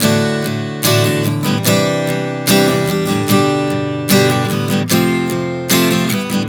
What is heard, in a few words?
Progression 074 D-Cadd9-G-F, Acoustic Guitar, Chord Progressions 074 bpm; Sonic Foundry; Songwriter Acoustic Guitar Companion